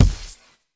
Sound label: fx drum, Electro FX; UNUSUAL KITS and FX